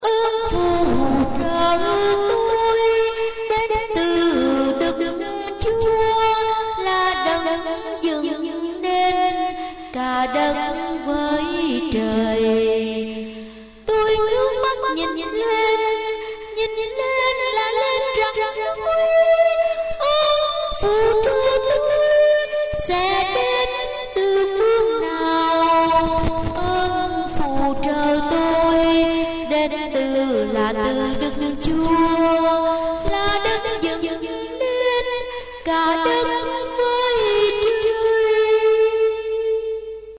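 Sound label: soprano alto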